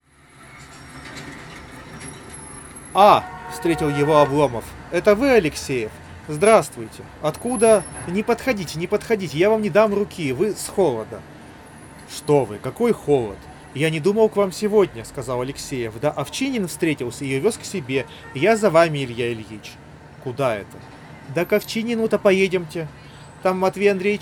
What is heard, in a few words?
bt recorder noise; qcy h4